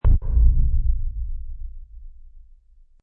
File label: Mega Sub Impact, Very low frequency, subsonic explosion; Science Fiction Sound Effects; Lynne Music